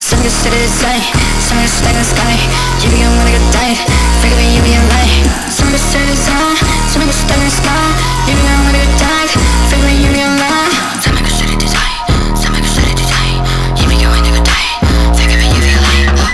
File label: drop pitched up